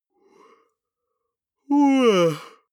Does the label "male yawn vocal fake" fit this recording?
Yes